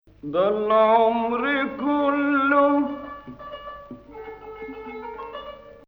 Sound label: Hijaz Octave